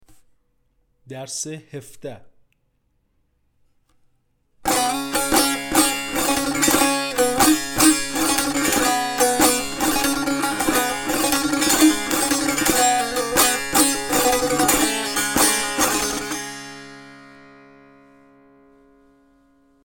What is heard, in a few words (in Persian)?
مشق تنبور; تنبور جلد دوم; هفتاد و دو مشق تنبور